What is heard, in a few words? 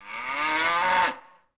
cow; Ambient; MX vs ATV Unleashed